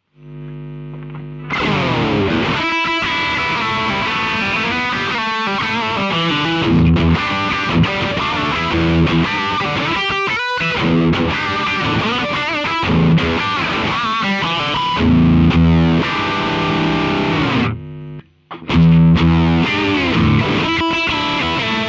lp drive

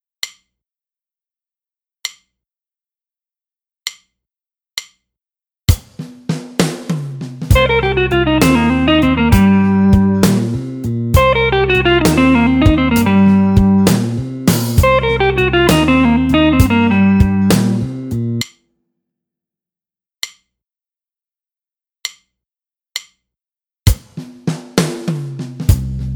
Build Up

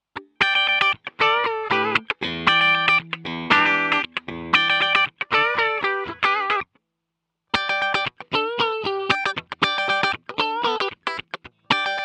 Vintage Rails Clean

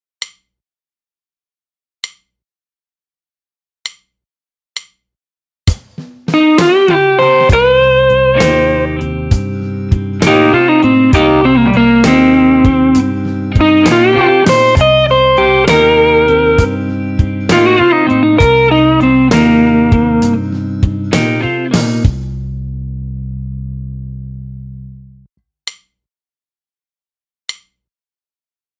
Build Up

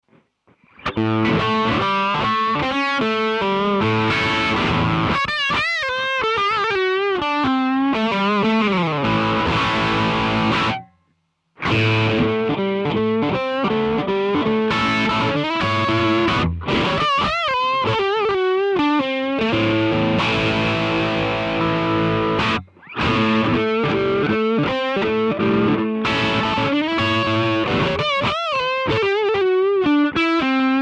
Little 59 drive

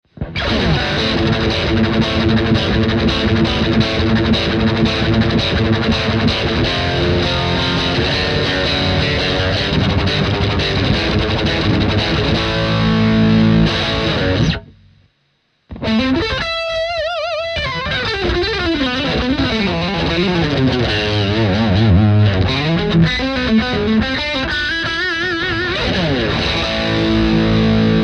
tone roland heavy

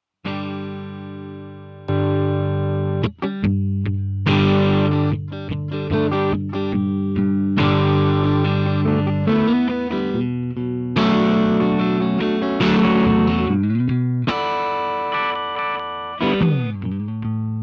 clean

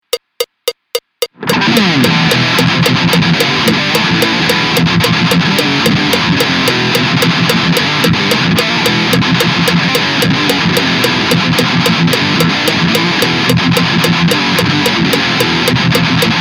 thrash

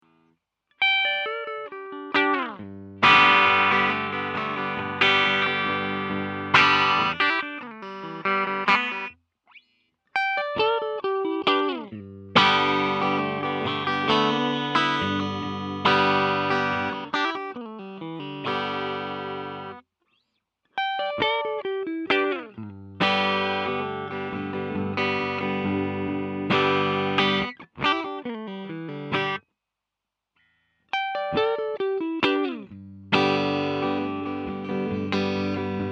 Cool Rails Clean

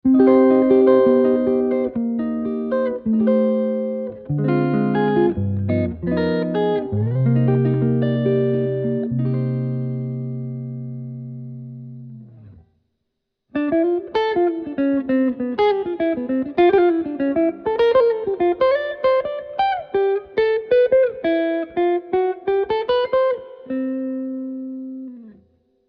tone roland jazz

